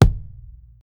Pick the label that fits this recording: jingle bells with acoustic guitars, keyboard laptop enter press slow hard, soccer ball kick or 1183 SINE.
soccer ball kick